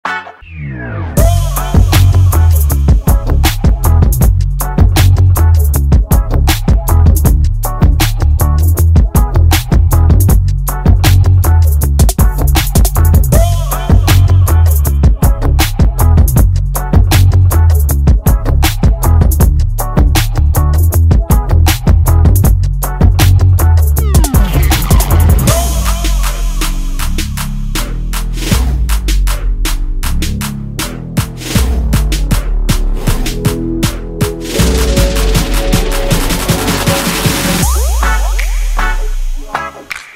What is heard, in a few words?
Instrumental